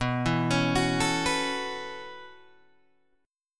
B 7th Suspended 2nd Suspended 4th down Guitar Open G, chord